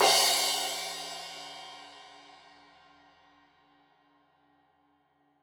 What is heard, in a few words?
Crash 15in L edge, Cymbals Stereo